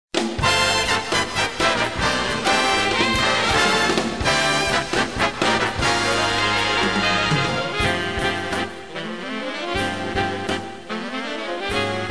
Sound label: swing big band